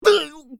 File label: Pig hit; bad piggies; android; mobile; windows